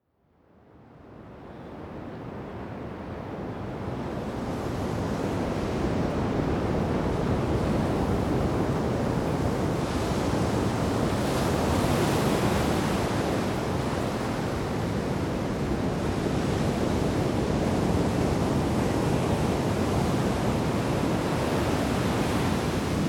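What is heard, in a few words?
ambience venice; Tony Hawks Pro Skater 2X; XBOX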